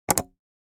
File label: sound of mouse click